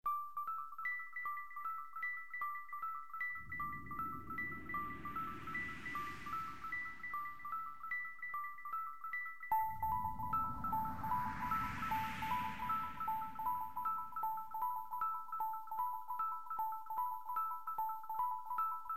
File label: Intro Theme